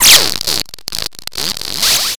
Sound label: science fiction radio static alien burst